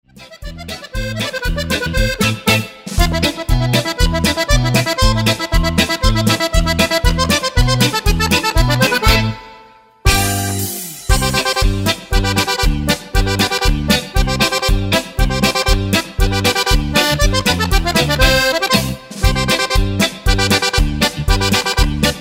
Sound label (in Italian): il clarinettista